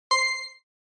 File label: Accent